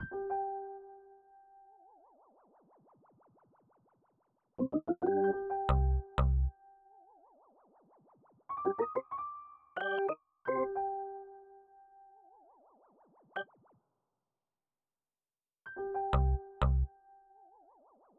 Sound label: Melody; Sonic Foundry (Sony Creative Software); Westside Underground